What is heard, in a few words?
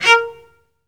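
solo cello, strings; ILIO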